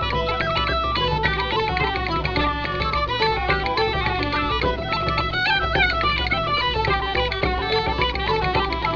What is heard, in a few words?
jig